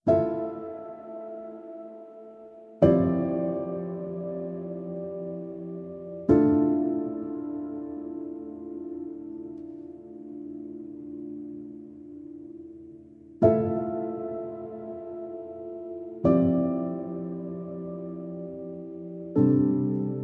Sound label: Piano Only